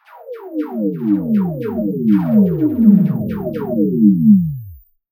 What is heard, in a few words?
snare